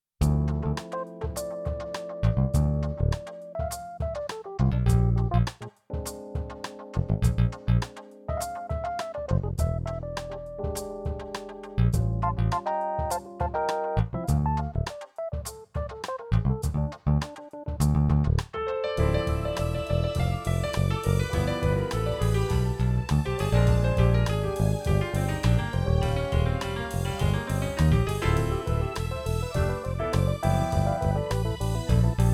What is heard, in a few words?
Jazz Combo, digital console piano